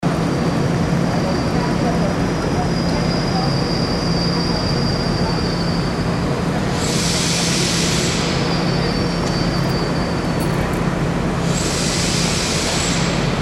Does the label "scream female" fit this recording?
No